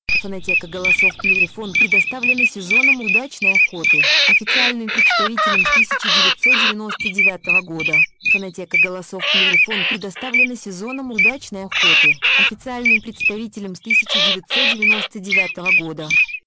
Чирок-свистунок; plurifon

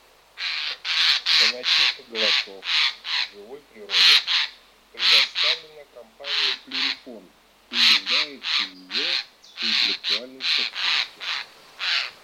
Сойка Ghiandaia

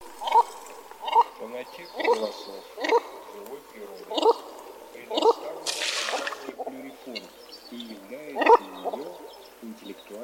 Клоктун Alzavola Asiatica

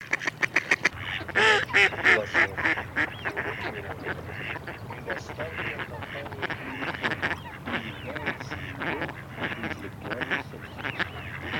Кряква Germano; plurifon

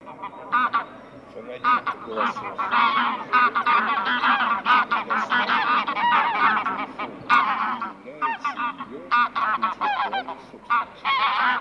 Гуменник базар Oche Granaiolle

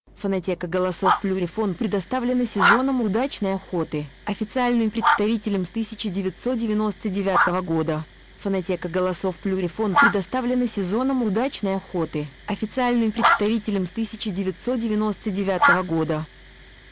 лиса; plurifon

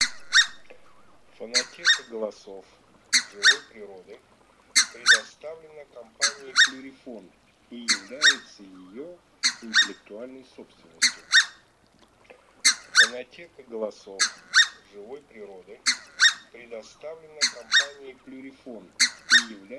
Казарка краснозобая Oca Collo Rosso; plurifon

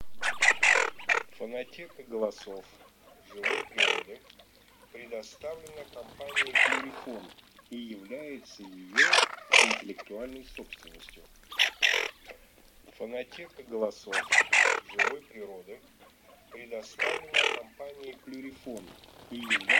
Чернеть Хохлатая Moretta

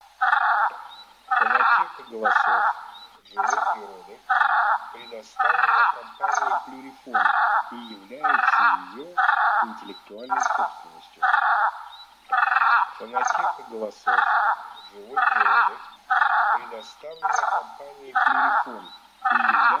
Турпан горб Orco marino dagli occhiali

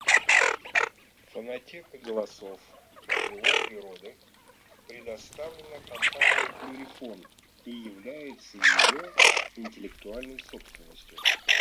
Хохлатая чернеть Moretta; plurifon

